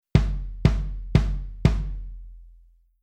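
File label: Rhythm Shuffle Sample bass drum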